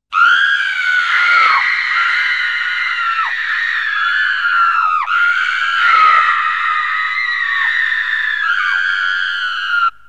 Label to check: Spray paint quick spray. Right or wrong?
Wrong